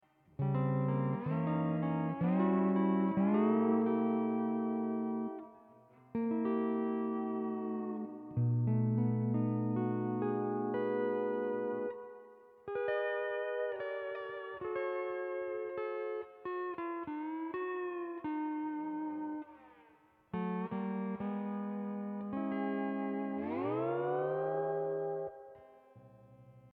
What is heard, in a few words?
hawaiian piece